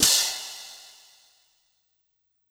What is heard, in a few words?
Hand Cym. H, Yamaha PSR-E323 Symphony Kit